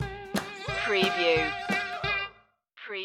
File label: shuffle stinger